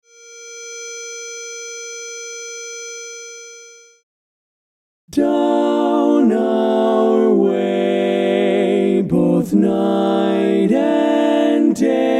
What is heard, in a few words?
No Bass